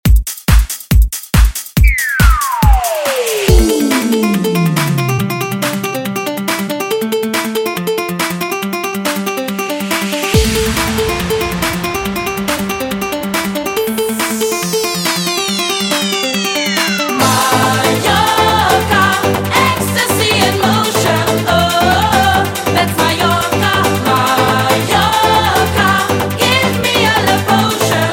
mallorca clean 140bpm; Latin beats

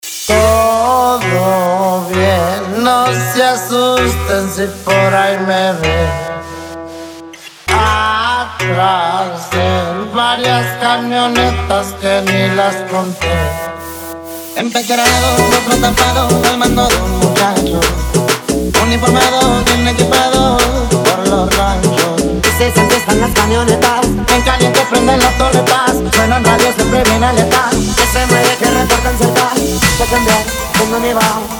Liquid Remix; 130BPM

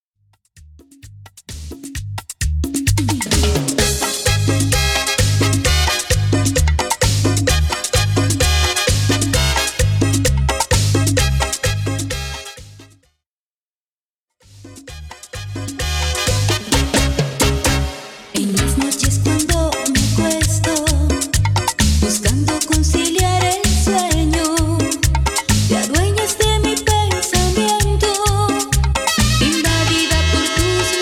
Intro 130 BPM

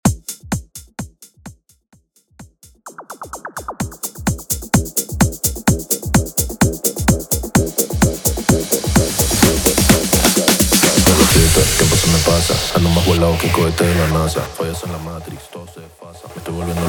128 bpm

